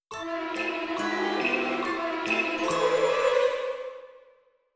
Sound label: sting alive; The Sims 3; Windows; frankensim